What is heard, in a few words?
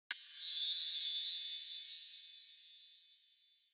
Onoma Sparkle Short; オノマトペ キラキラ09-2(短)